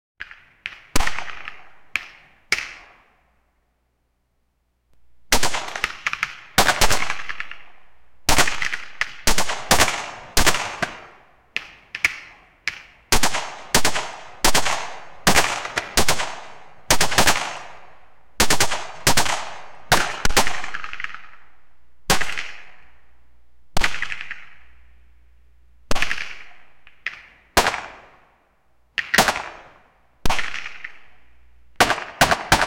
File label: a pistol fight with ricoc